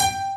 TRINITY PNO; Pro-Rec - Synthsonic

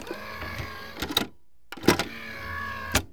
FX; AMG Megabass REMIX! VOL-2

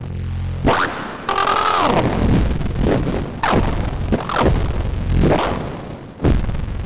weird glitch sound

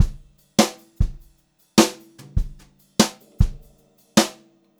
JZ VARI, Brushed Drum Loops, JAZZY; USB Soundscan vol.39; AKAI

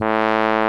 POP BRASS

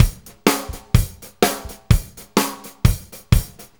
Big Jim, Drum Tools, Loops; Sonic Foundry (Sony Creative Software); Loops & Samples Drum Tools

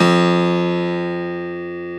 STACK PIANO; AKAI S6000 CD-ROM - Volume 1

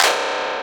FX, FUNK, Hip-Hop & R&B; USB Soundscan vol.47; AKAI

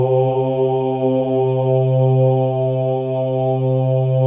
MALE OHS; Spectrasonics; Symphony of Voices

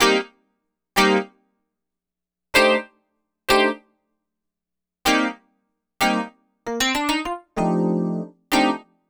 EP, Keyboards, Electric Pianos; Sonic Foundry (Sony Creative Software); Mac Money

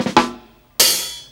FILL DRUM; USB Soundscan vol.27; FILLACOUS

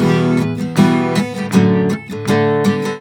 EMGTR; USB Soundscan vol.42; AKAI

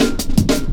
metallic stepz cut, drum loops; Zero-G Jungle Warfare 1, 2 & 3

